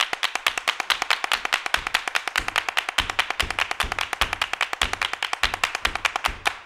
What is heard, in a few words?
LONG CLAP, LNG MULTCLAP; Best Service; World Colours